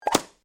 Dart Hit Intro; DailyChallengesPopup

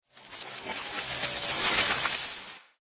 Coins Raise

